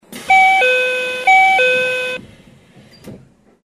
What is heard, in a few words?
door chime; 7000; raicho